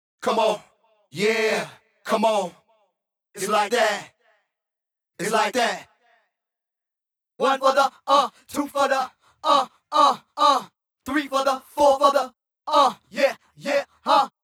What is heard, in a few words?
Vocals